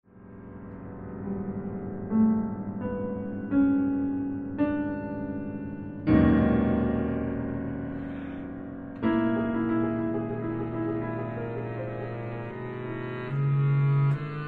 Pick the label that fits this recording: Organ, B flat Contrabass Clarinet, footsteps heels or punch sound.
B flat Contrabass Clarinet